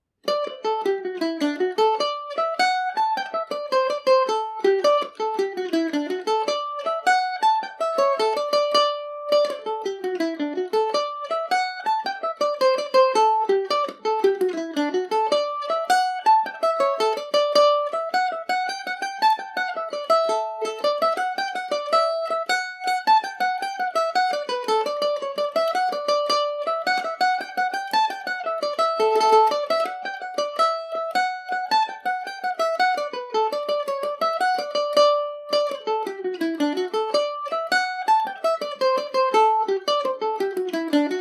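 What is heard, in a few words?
bigamist D major, mandolin; The Bigamist; G&O #34 mandolin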